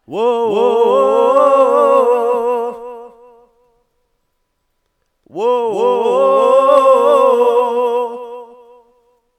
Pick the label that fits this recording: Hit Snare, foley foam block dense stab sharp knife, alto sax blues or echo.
echo